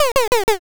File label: laugh single